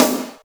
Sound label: short acoustic snare b key